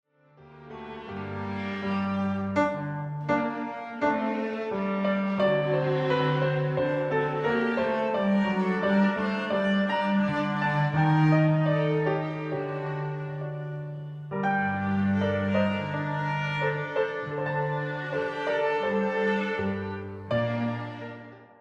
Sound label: cadences; Bologne, Joseph, Harpsichord Quartet, mvt. II Rondo, mm. 48-59; harmonically open